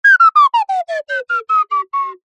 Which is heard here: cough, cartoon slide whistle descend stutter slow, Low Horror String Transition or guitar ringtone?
cartoon slide whistle descend stutter slow